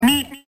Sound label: meep meep